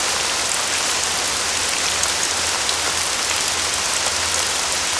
ran loop; DAoC

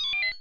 item select

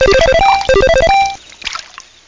diver; My Animated Word Book; sea

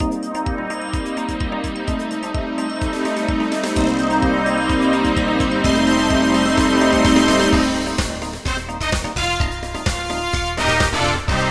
DANCE; nostalji

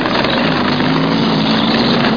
tank move; Red Shark

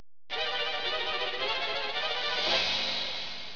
fire end

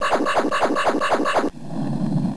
altar; dungeonmaster

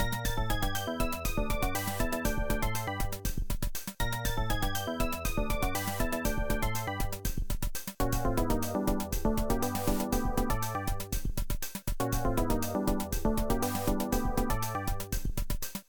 FUNKY

